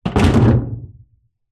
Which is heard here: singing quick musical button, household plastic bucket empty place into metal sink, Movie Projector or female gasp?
household plastic bucket empty place into metal sink